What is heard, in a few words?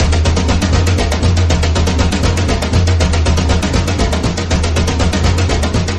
drums; horde